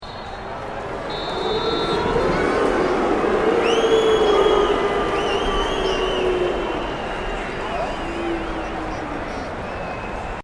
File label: CROWD 20K SOCCER BOO, Soccer at Docklands Stadium; Backgrounds; Crowds